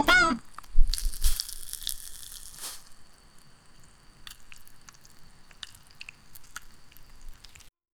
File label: crunchy fry biting sound